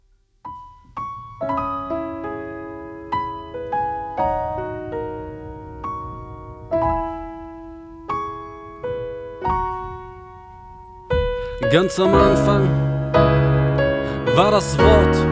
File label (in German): (Piano Version)